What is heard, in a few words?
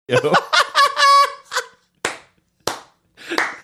laughing clapping